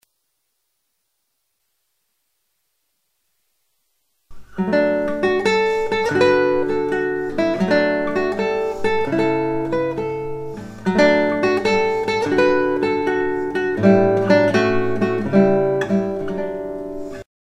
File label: guitar